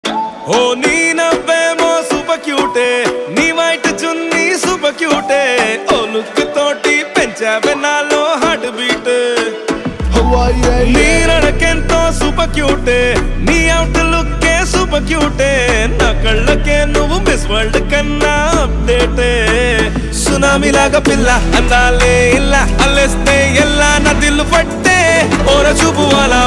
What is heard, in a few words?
super cute